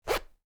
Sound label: jeans zipper, cloth